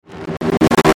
Stutter Robot Sweeper; Production Element Imaging Element Accent Transition

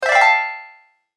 Open Bright Game Sound; Video Game Sound Effects; electronic sound effect

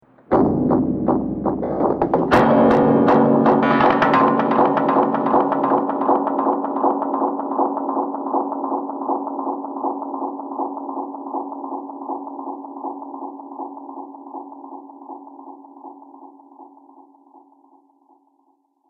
Distorted Knocks And Hits; Distorted Sound Effects; Andrew Fleming

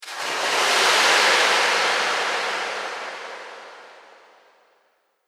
Reverb Splash Whoosh; Whoosh Sound Effects

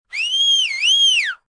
Whistle, attention grabber, (human voice); Whistling

